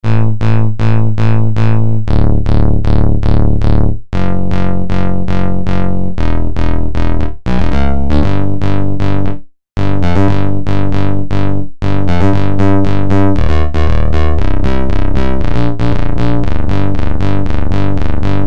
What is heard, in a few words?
Pulse Bass; Green Oak Crystal